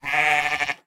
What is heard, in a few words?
Sheep, notifications; ZTE Warp